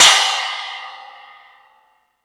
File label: Space Drums, E-MU Pro-Cussion; drum machine